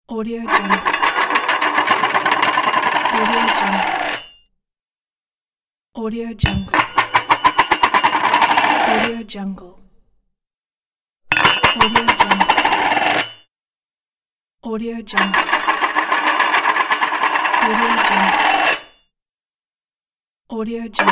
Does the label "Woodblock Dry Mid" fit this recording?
No